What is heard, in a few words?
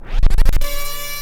Synth Scratch; Mo' Phatt; Digital Sound Factory Mo' Phatt